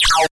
Multiple interface error tones at various pitches.; Error, Interface Pitch, Tone Web Efx, Error